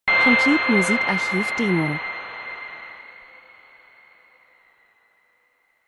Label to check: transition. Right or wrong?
Right